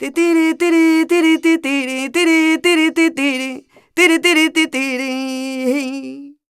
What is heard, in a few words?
voc c, Flamenco, Vocals, Female Cantaora; ProSamples vol.46